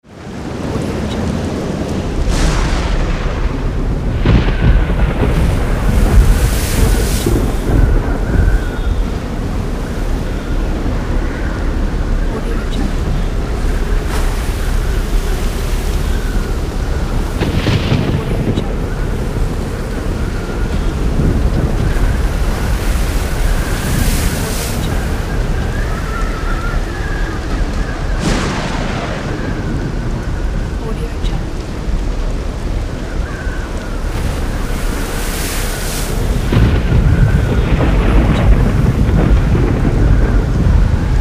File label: storm